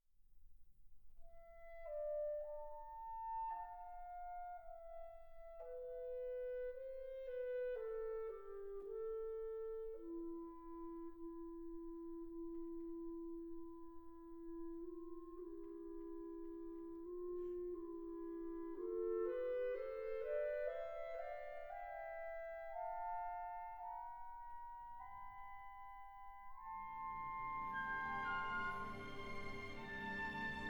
Piano Concerto No 3 in C major Op 26 Andante Allegro; Prokofiev